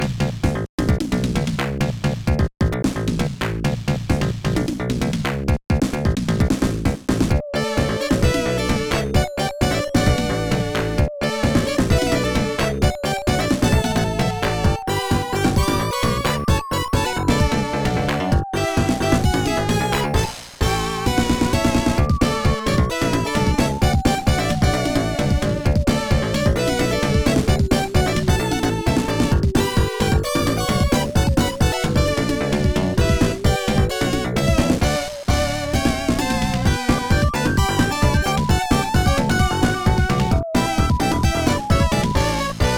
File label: Bonus Stage 2 (Slot Machine); Sonic the Hedgehog 3 plus Sonic and Knuckles; Sega; Megadrive-Genesis